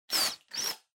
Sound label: Blowout